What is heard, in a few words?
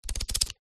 household book very small pages flick through